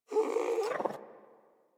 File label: Puppy Interact Affection Closed Mouth; Enemies; Kubrow; Warframe